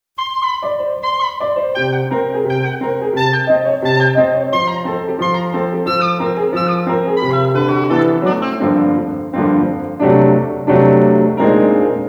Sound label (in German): für Oboe und Klavier